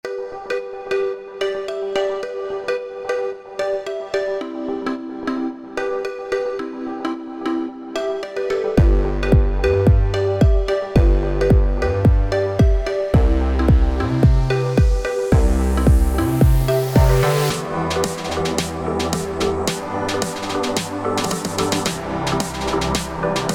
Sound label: 110bpm